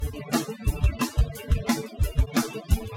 Groove

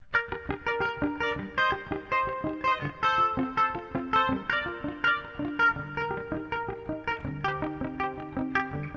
triads mix

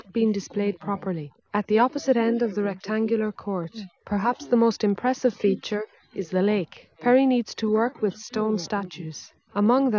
female

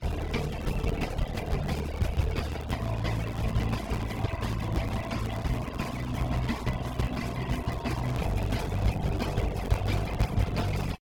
groove hard

